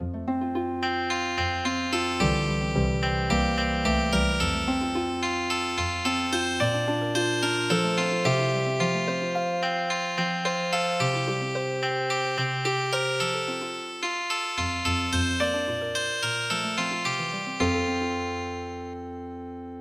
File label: Healing Harp, traditional; midi traditional; kotoritachiha